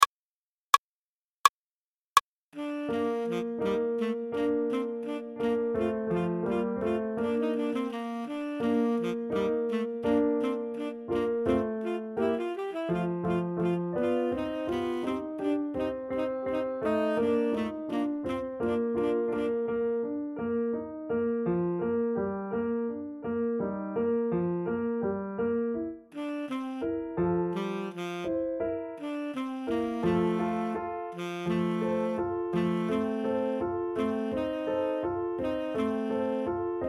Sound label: Tenor Sax Melody; Hadyn